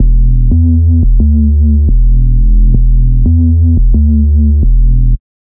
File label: D&B Bass Loop; neuro